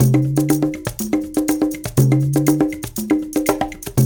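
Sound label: 120 bpm, Latin; Spectrasonics; Supreme Beats; African Contemporary; Latin MM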